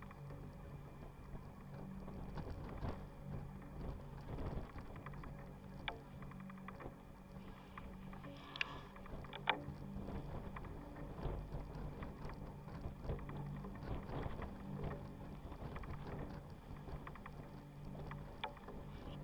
Sailing

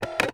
Single Shunt, DVD Drive External

